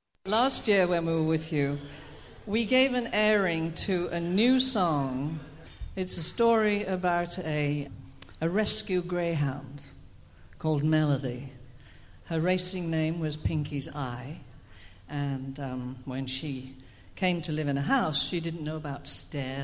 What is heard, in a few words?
Melody